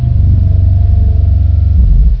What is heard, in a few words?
dino sound